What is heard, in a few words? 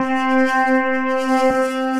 Bass Trombone Augmented; Instruments; Bold Brass; Ableton; Factory Packs; Mood Reel